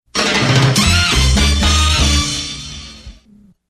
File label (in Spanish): fanfarria; chispazos